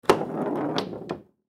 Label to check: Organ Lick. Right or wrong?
Wrong